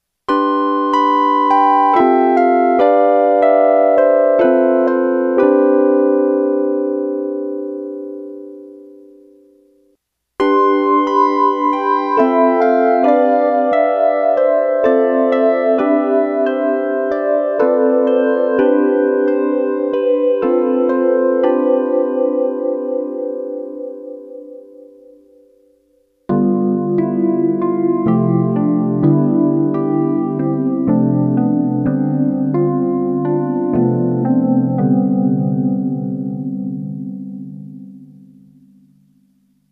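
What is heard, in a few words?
stereo anti tri, multiphase; Scott Stites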